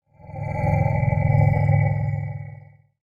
Shuttle Fly By, Sci Fi

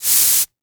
Hydraulics; Motors & Switches

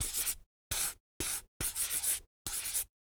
Write on Cardboard with Marker; Office Supplies

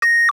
Sci Fi Beep

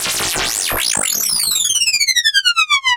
UFO Sweep; Transitional Elements; 1001 Sound Effects

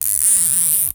Cable Tie; Tools

